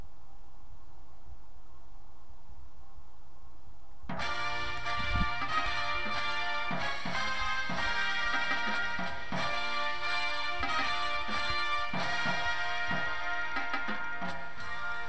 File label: tenors with music, drumline